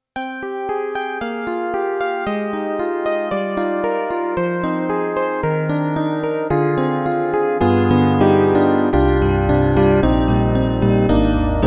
YAMAHA MU90 DX Electric Piano Hard

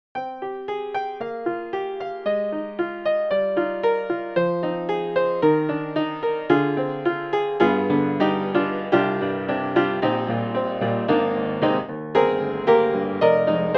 Upright Piano Upright Intimate; Native Instruments

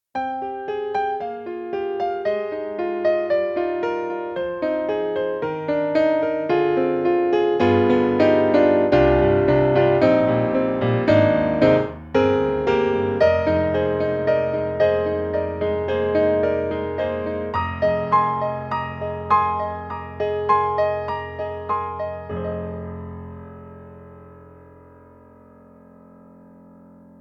Steinway D Close Mic; Orchestral Tools; The Orchestral Grands

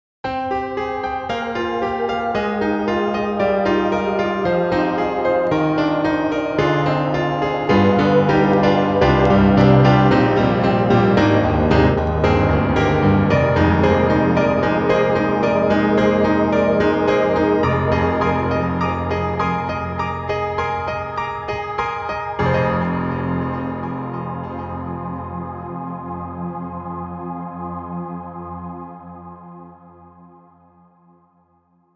Micropiano, Trance Stack; Cakewalk; Rapture Expansion Pack 2; RGC Trance Stack 2

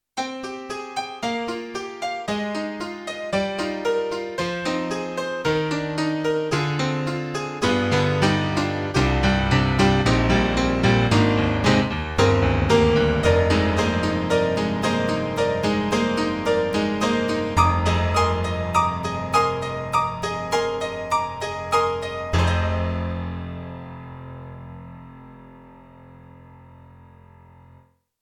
Alesis NanoPiano Acoustic Piano HousePiano

